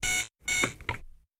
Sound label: gun reload; World of Tanks